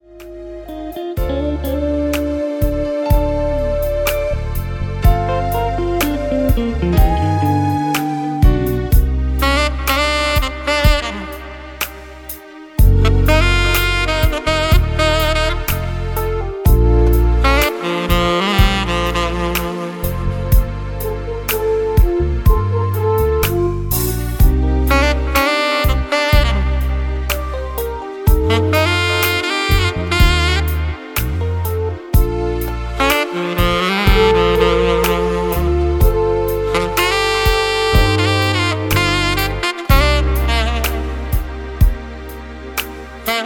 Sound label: Saxophonist